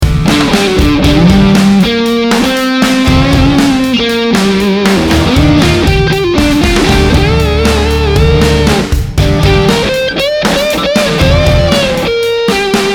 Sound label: thunderverb more gain; thunderverb200; IK Multimedia AmpliTube Orange